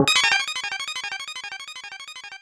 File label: Flash; mother2